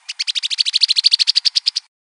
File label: Cowbird chatter